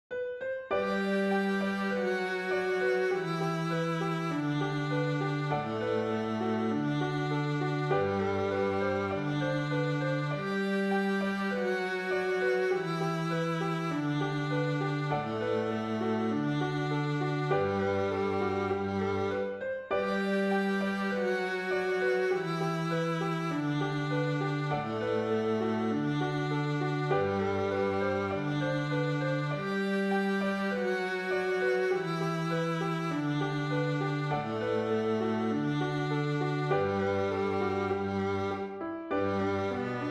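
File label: reel, melody with viola cello; Dons Birthday Reel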